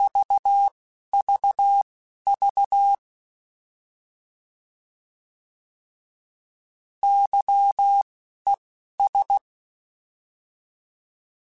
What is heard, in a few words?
Morse Code, CW Code Groups; HamRadio